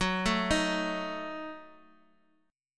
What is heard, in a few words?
Gb Minor 6th, Guitar Open D